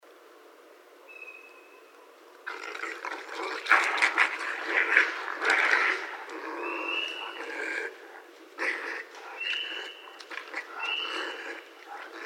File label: Raccoon; Northern Raccoon (Procyon lotor)